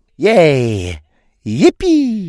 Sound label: yay yippee